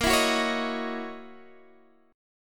Gb 13th Bb, guitar

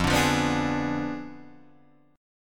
F Diminished 7th F down Guitar Drop D

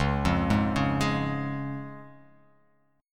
Db Major Db; arpeggiated; JGuitar

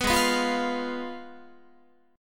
Ab Minor 6th, Ab, Guitar; Abm6; strummed; 14,16,14,15,15,16

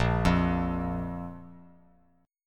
A sharp 5th A sharp; arpeggiated; JGuitar

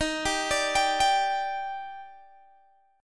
D sharp Major 7th, Guitar Open G

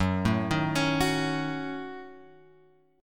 F sharp Minor 6th F sharp; F#m6 Chord {2 0 1 X 2 2} arpeggiated

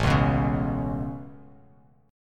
A Major Add 9th A Bass Standard, chord; Aadd9 strummed; JGuitar

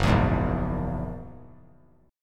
Gb 9th Gb down 3C,3F,3A,3D; strummed; JGuitar

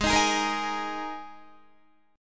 G sharp Suspended 2nd, Guitar; strummed

